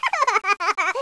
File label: LAUGH